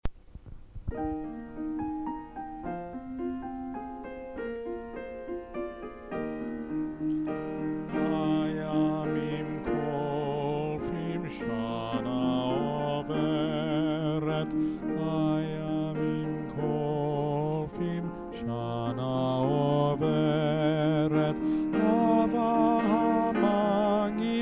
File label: men; Men Harmony; Rosh Hashana